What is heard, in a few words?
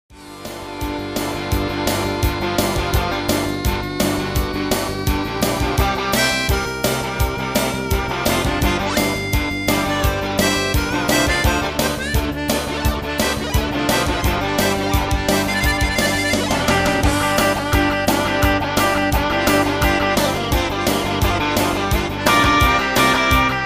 music horn sample midi